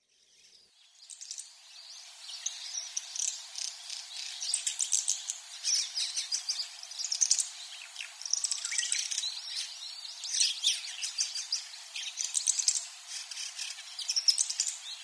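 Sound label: Rosy Starling, Yonaguni; barairomuku; koe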